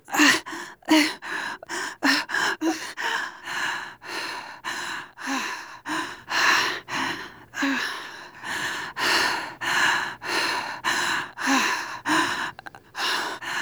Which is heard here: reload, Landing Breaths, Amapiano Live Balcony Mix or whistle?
Landing Breaths